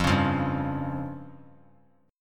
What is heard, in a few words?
F Suspended 2nd Sharp 5th F down